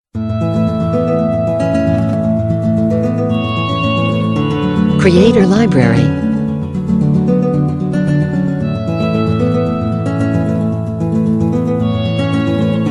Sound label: Family Tree Acoustic; Folk-Acoustic